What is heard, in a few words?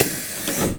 bus window; best service Blue Box